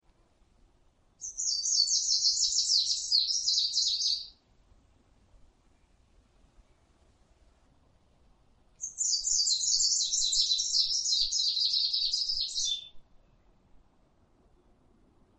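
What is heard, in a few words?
zelenaya penochka golos, greenish warbler voice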